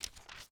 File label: Household Book Telephone Book Flip Page